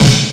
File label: Ambient Wood Snare gated; percussion; SDs; SamplePool3